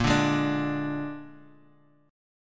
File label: Ab Suspended 2nd Flat 5th Bb, chord